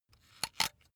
razor retract comb; soundpool

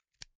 insert xlr extension latch hard heavy; objekte; elektronik

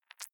click noise, signale

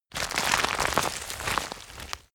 rotten wood crack; foerster

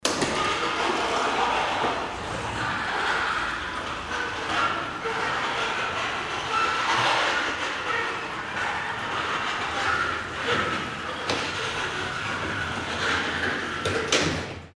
garage door electric

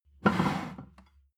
plate stack slide table, gastronomie; soundpool

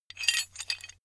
metal handling pieces

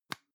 plastic can lid screw close soft dry; objekte; dose

